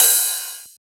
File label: Ride; FLPRO